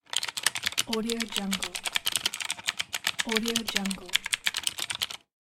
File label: typing on gaming keyboard